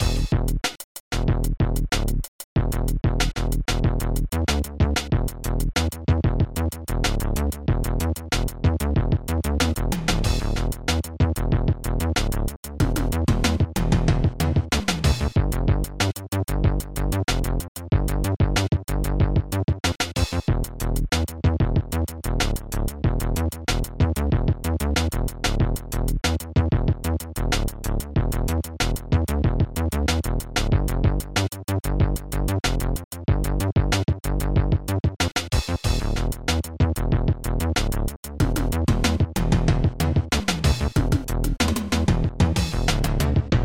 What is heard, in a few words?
BEAT; nostalji